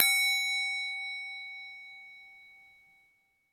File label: ping; waze